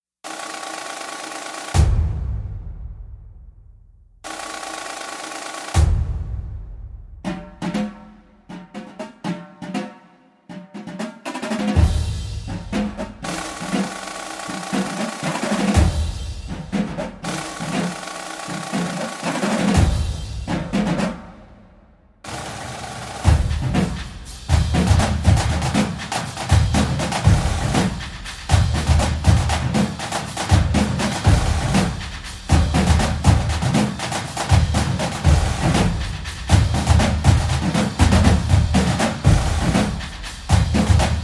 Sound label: drumline; brand x music